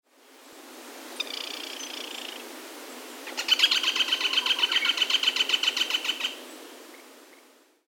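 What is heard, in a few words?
white-backed woodpecker, daisen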